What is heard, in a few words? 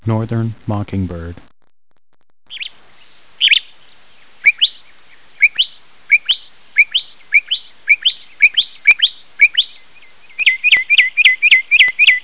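mocking sg; Explore Coastal Louisiana with Boudreaux; Birds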